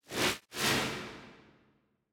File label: Wash Whoosh Left To Right To Left; Wipe; Blastwave